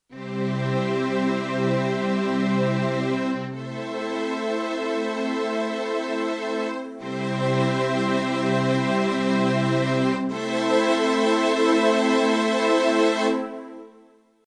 korg i3 orchester; Korg i3 Test

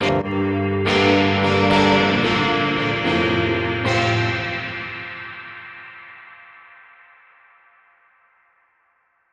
schraddel far hall; eventide h9

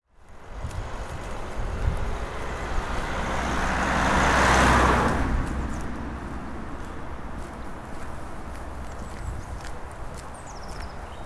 Auto; zoom h6

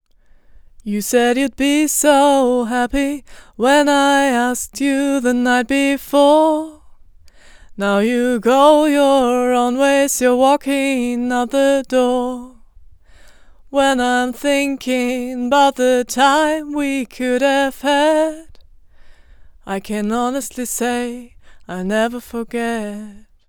gesang; akg c636

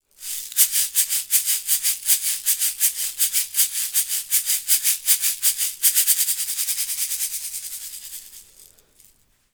shaker; t.bone sc 300

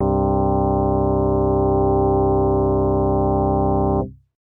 fm synthese sine chord sinemod; yamaha

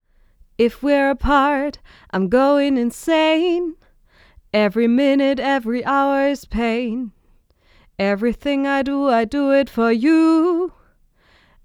gesang; fame pro series vrm-24 test

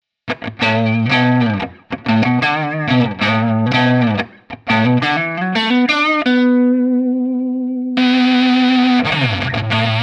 beer fuzz hals steg

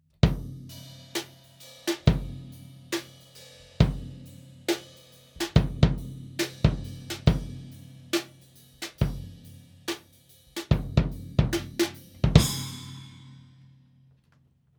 Beyerdynamic TG D71c 30cm vor 18er Bassdrum 8er snare

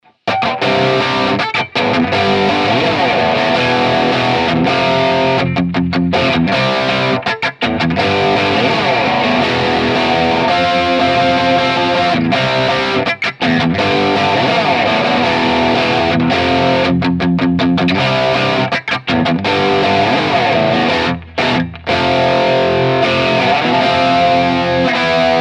crunch steg marshall jcm800, gibson les paul traditional

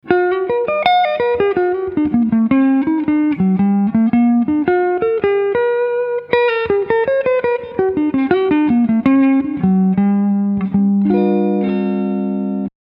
epiphone casino coupe hals p90

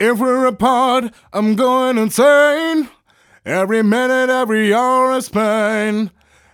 effekte vocals neve 1073 highshelf 360hz 110; hpf

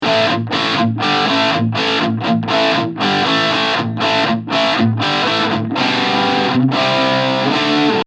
gtr rhythm bearbeitet

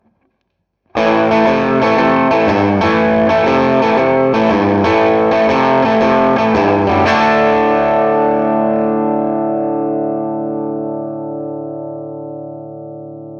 e-gitarre club stage; fabfilter pro-r

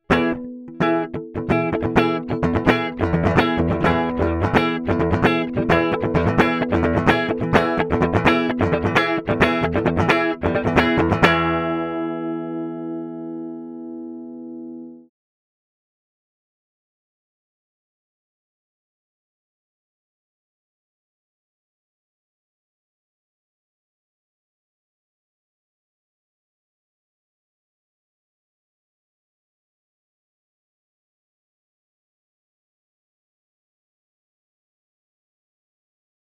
mercuriall spark superbass ohne plugin

